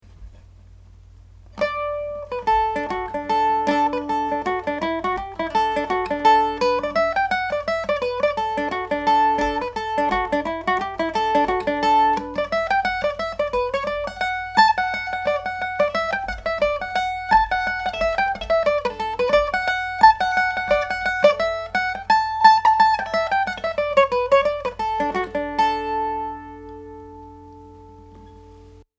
last nights fun, Irish Mandolin

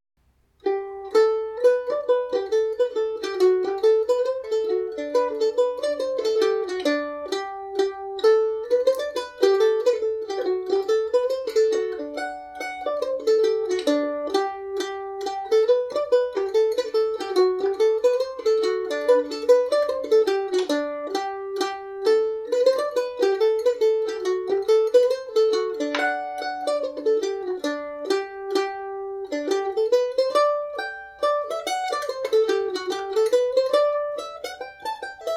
hornpipe, irish mandolin; kit o mahonys

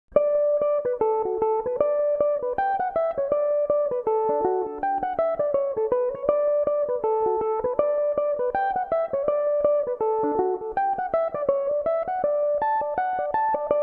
reel, D major, mandolin; Mickey by the Fireside; Belmuse

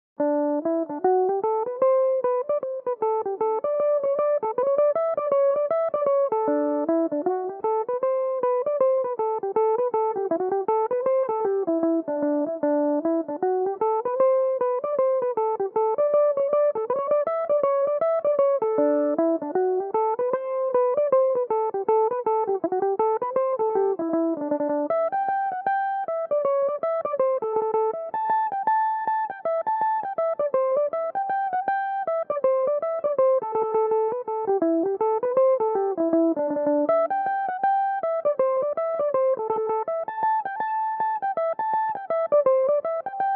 farewell to connaught, Irish mandolin

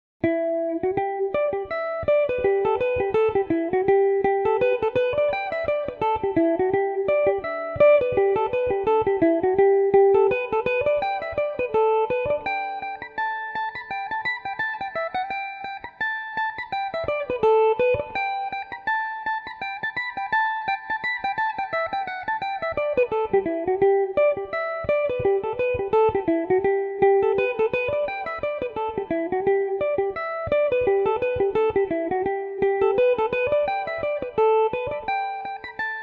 galway rambler gmaj, mandolin; The Galway Rambler; Revelation Jaguar electric mandolin

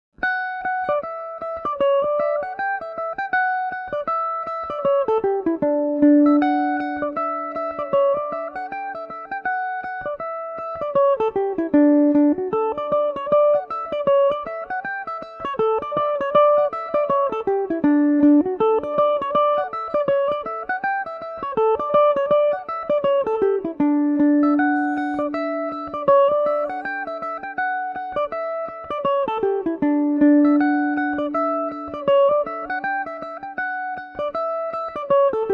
green pantaloons dmaj, mandolin; The Green Pantaloons; Revelation Jaguar electric mandolin

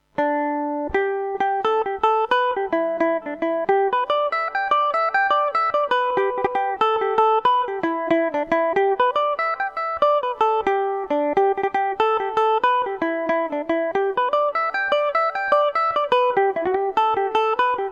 haunted house gmaj, mandolin; The Haunted House; Revelation Jaguar electric mandolin

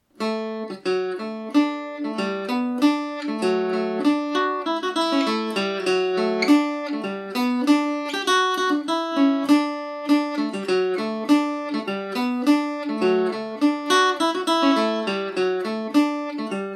dmaj, mandolin; The Elver

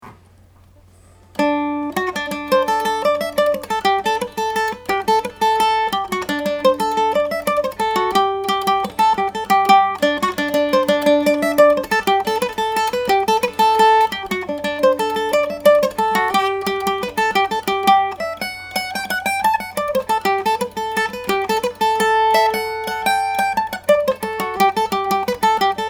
old hag you have killed me, Irish Mandolin

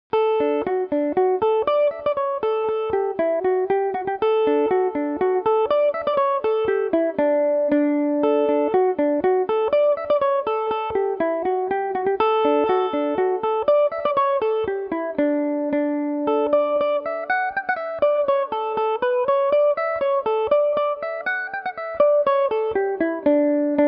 jer o connells dmaj, mandolin

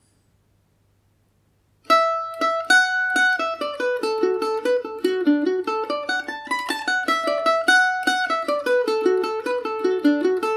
whistling postman dmaj, mandolin; Paris Swing “Macaferri” mandolin; Listen to me playing The Whistling Postman on my Paris Swing "Macaferri" mandolin

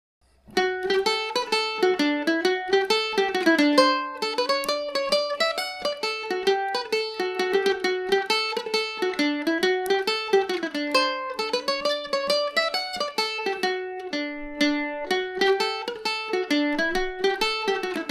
sonny murrays hornpipe, irish mandolin

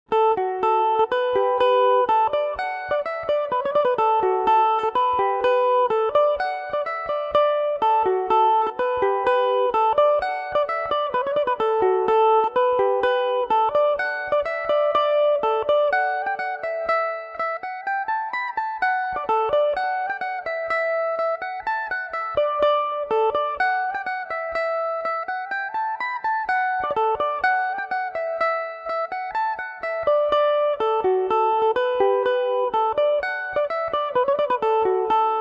john egans polka dmaj, mandolin

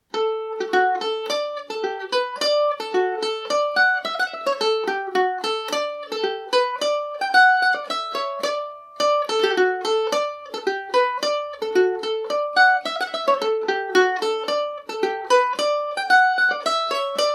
elver dmaj, mandolin; The Elver; G&O #34 mandolin

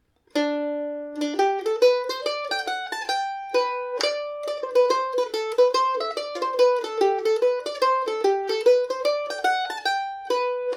hornpipe gmaj, mandolin; The Glenbeigh Hornpipe; Kentucky KM1000 mandolin

